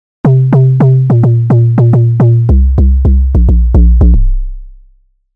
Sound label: 808 style